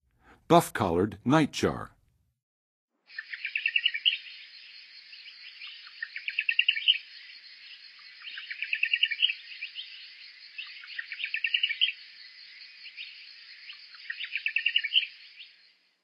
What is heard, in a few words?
Buff-colored Nightjar; North America; Western USA; Western USA Songbirds